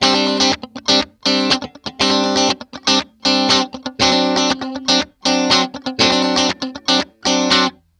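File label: GIT-CHRDLICK, guitar chord lick, Disco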